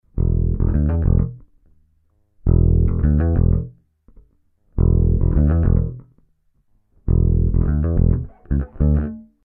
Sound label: BASS